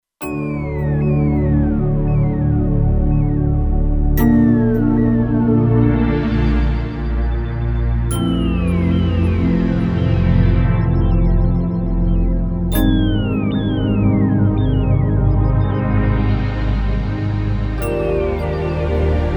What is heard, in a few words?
Big Pad, Kurzweil K2500X